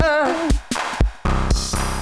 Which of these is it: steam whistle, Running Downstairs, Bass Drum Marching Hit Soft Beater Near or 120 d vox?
120 d vox